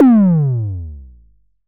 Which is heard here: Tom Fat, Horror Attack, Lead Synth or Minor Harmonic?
Tom Fat